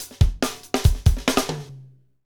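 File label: beats funk, Roland; Northstar - Drumscapes Roland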